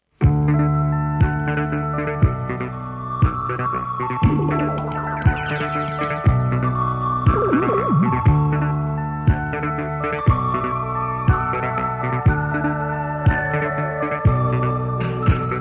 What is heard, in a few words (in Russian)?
budilnik minus